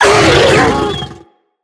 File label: Infested Juggernaut Vocal Pain; Vocals